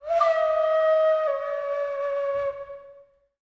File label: Columbian Flute